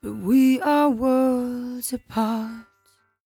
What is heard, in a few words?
57bpm Am, Velvet Vocal; Zero-G